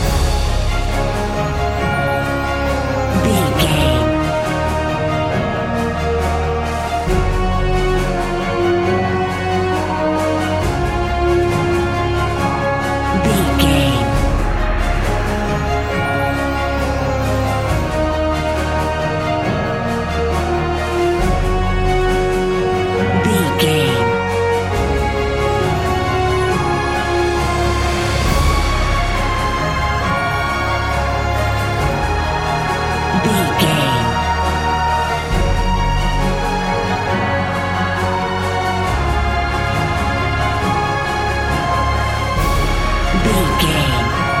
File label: epic emotional loop